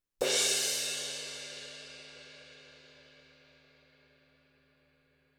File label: 18 CRASH CRASH, cymbals